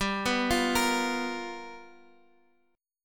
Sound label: G 7th Sharp 9th G chord; G7#9 arpeggiated; JGuitar